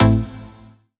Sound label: Synth Shot A